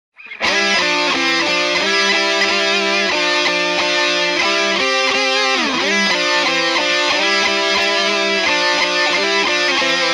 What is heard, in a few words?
Timbre Rock Melody And Harmony